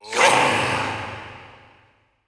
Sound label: Processed Battle Shouts, Viking Vocal